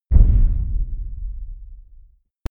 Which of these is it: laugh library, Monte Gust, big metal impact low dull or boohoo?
big metal impact low dull